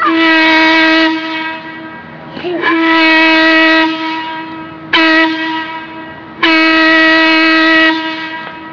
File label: organ, steam